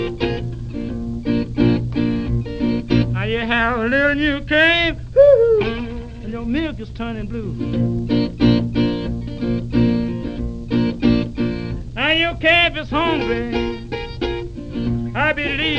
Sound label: blues